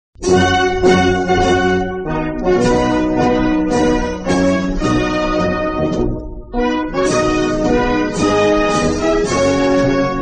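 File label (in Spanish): himno instrumental, Himno de la Guardia Civil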